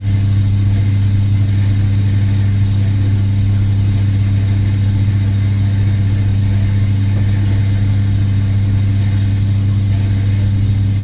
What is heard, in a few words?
household immersion heater; sound effects one